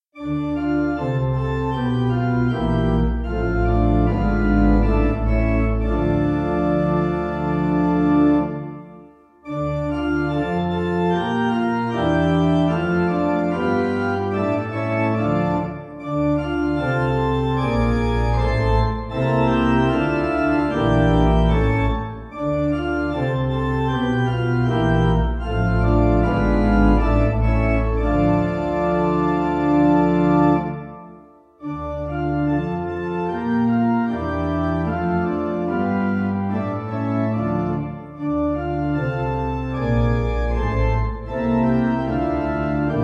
Please God Help Us French Pipe

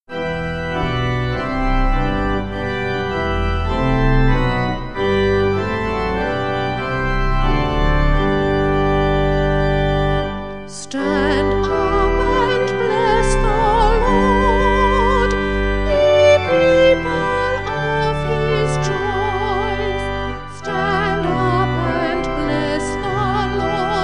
Stand Up And Bless Vocal Organ

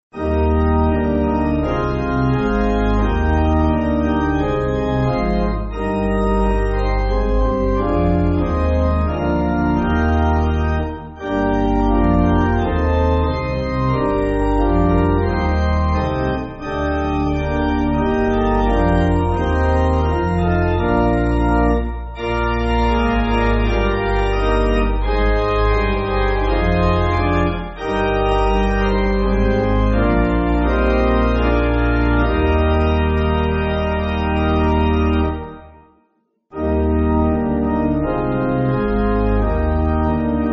God Of Grace, Pipe; Lingwood

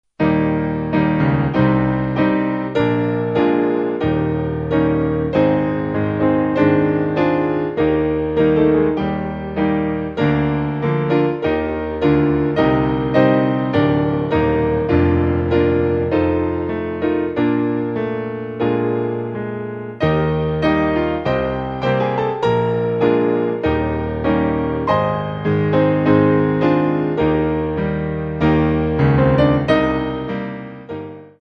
Holy Holy Holy Nicea Piano

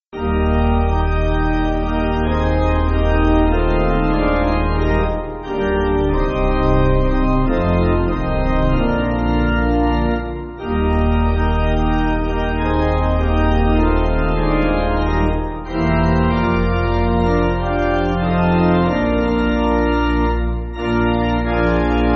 Pipe, Pipe Organ, Music Backing

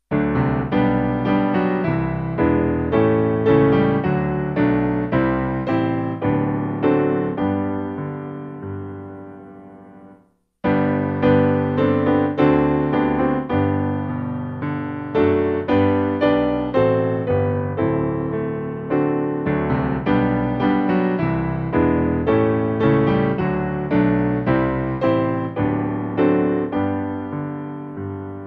A Charge To Keep Cambridge Piano

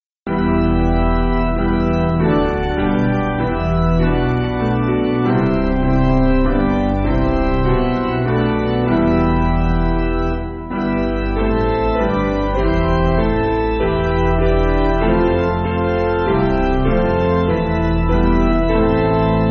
Piano

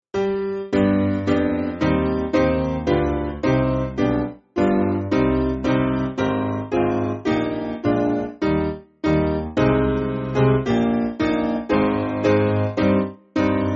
Credo, Piano; Simple Piano; We Saw Thee Not

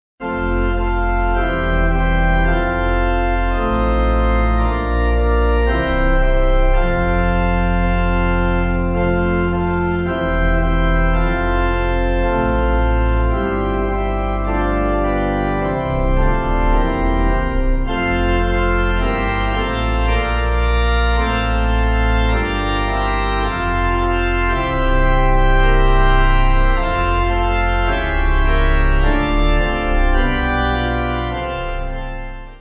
Pipe

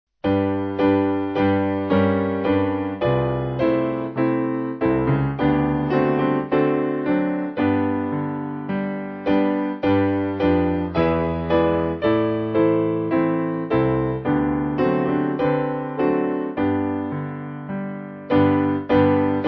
Piano; St Louis

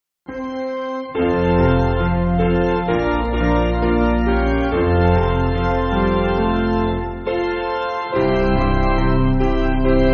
Hymnary Piano

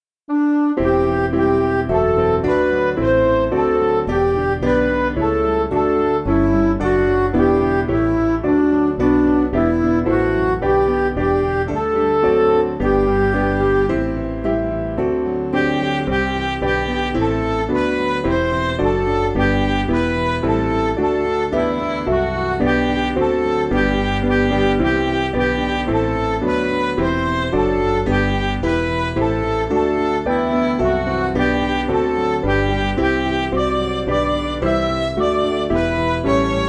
Midi; A Giving Happiness; German

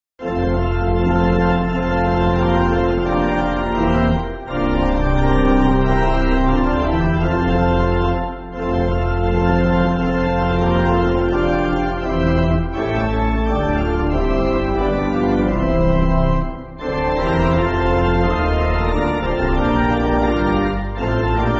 Pipe Organ, Music Backing Files for Churches

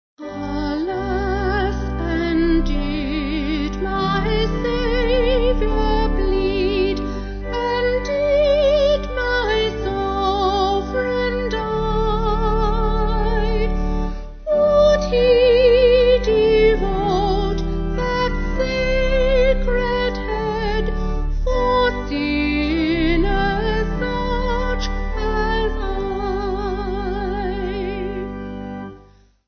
Voice Organ; Avon

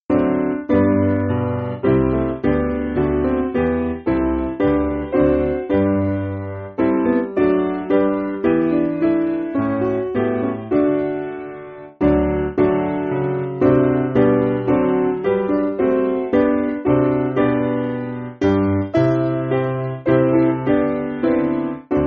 Piano, Music Backing Files for Churches

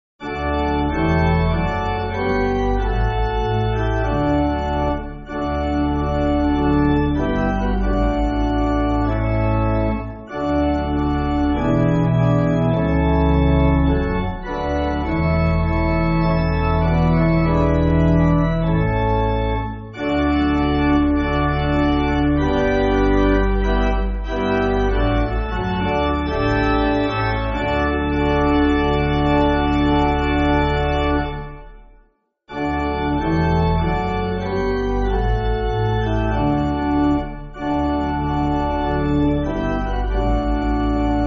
Pipe, church music

